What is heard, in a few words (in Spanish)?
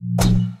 Shield aparece